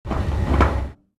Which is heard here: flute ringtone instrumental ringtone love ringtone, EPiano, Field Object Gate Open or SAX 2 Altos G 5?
Field Object Gate Open